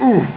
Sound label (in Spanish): GOLPE